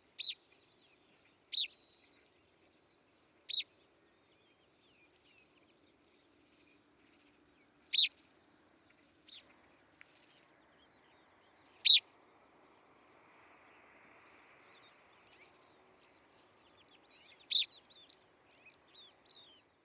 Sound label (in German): spornpieper